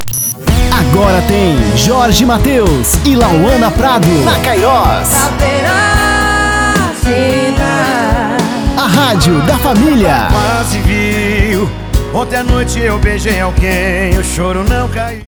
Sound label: intro music power